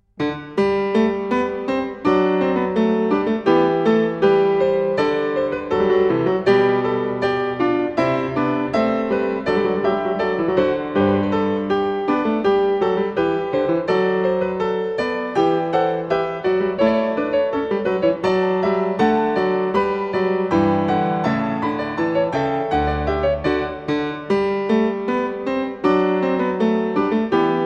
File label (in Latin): Variatio 30 Quodlibet